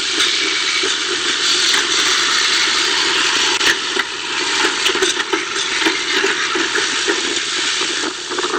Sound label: tram; tramvaj